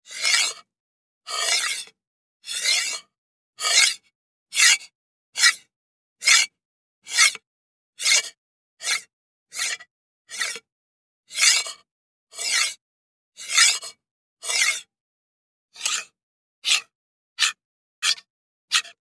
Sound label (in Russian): zvuki podzornoi truby